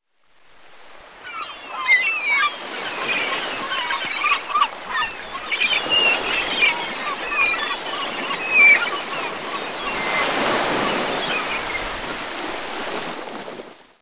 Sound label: seaside